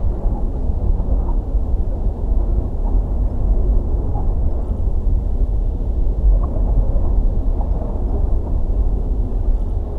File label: choppy ocean waves and wi